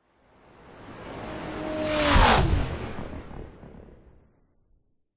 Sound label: sound design whoosh into slow motion; zapsplat